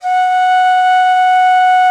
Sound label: alto bon, India winds; World Combos; E-MU Producer Series Vol. 7 – Old World Instruments